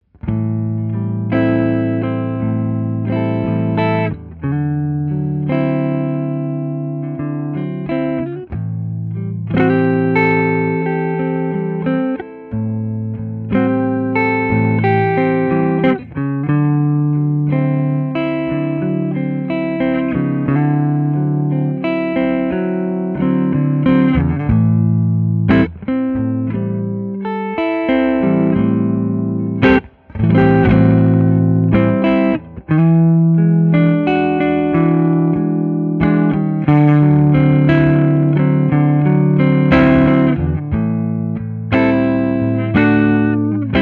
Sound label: deluxe lp gold top